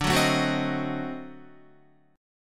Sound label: D 7th Flat 9th D down Guitar; D7b9 strummed; JGuitar